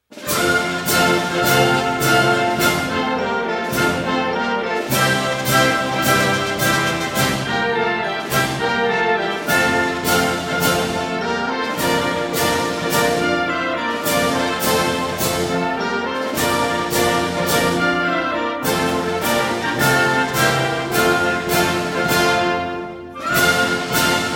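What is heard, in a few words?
march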